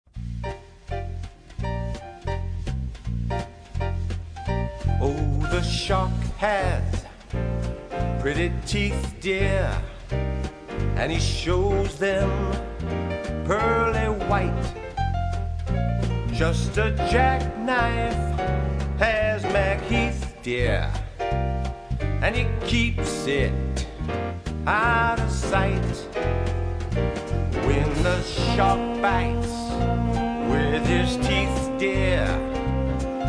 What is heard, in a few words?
vocals